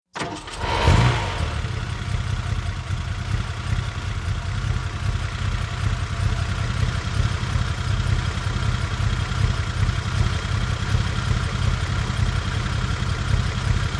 VEHICLES ASTON MARTIN: EXT: Start, idle, switch off, center of car